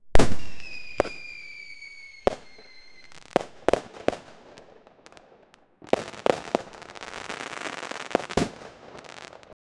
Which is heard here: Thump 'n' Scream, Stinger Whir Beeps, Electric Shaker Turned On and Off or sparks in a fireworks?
sparks in a fireworks